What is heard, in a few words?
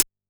DnB Drum Hit Hat; drumnbass 170bpm; Drum Kits; musicradar; Drum Hits B